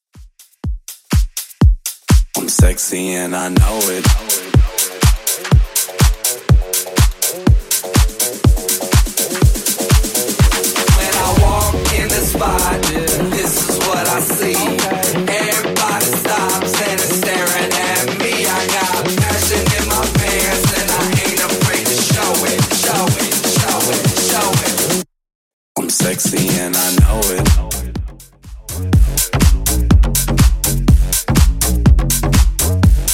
Bass House Edit 123bpm

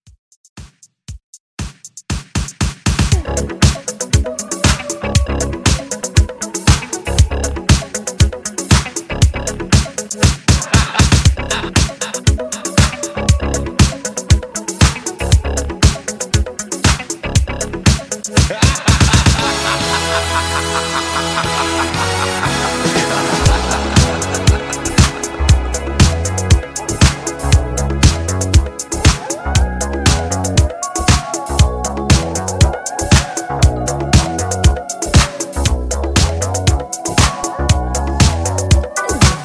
80s Halloween Redrum 118 Bpm

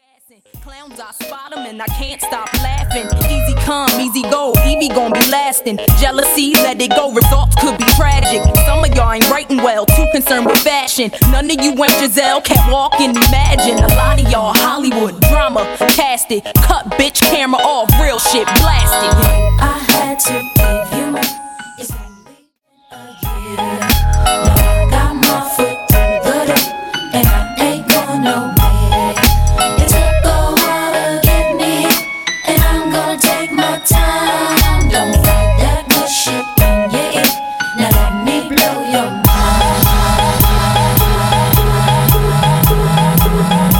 House 126 BPM; HouseMx

